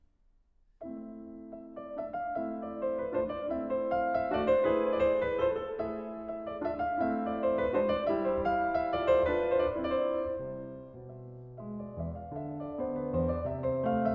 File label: Leaping Dance, Piano; 25 Norwegian Folk Songs and Dances, Op. 17; Grieg